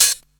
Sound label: HH, Dance Drums; Best Service; Dance Mega Drums; HH HOU